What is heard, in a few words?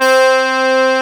BANDONEON